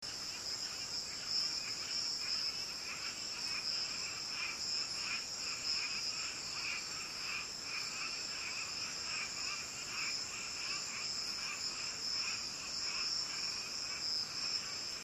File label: osteopilus dominicensis, parque nacional del este dominican republic; Its call is similar to that of the Jamaican laughing frog; Hispaniolan laughing treefrog; West Indian